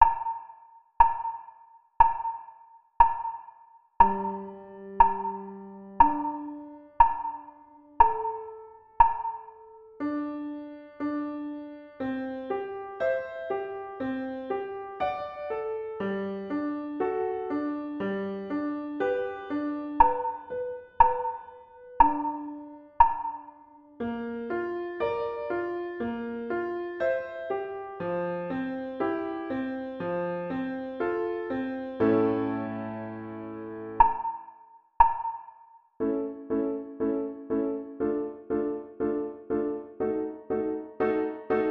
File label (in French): violon